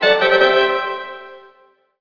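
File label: music sting positive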